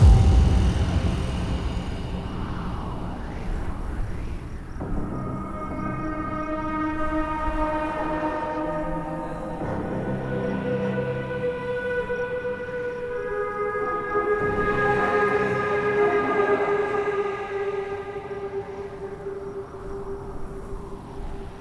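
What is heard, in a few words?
portal ambience; conan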